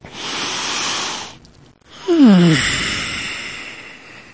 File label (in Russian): dihanie glubokij vdoh i vidoh